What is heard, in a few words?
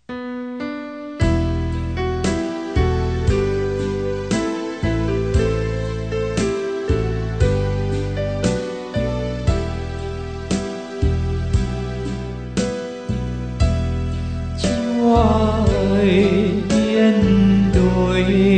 BASS